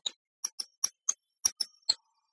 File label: Glockenspiel